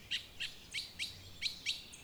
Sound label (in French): Cukucukukucui, Oiseaux